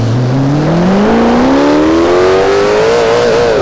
first, vehicles

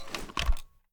raise first end; gmod

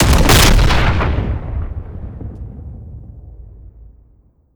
c4 expl body; gmod; viper

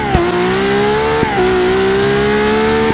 second, vehicles

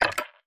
restock lethals, user interface; gmod; mw

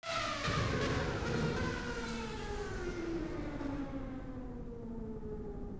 distant off, vehicles lambo2

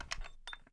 reload fast rotate; gmod

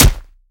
melee character fist; viper

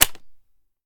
reload mag in; gmod; viper; weapons

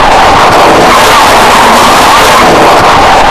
med, tires; vehicles

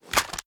reload fast end; viper

